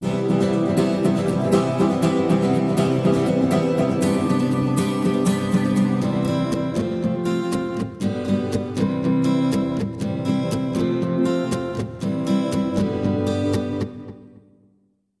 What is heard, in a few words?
Echo rpt + reverb